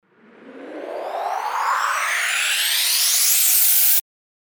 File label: RISER